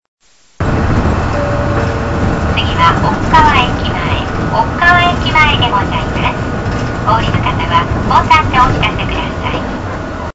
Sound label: bus tape orgel; aichi; chitabus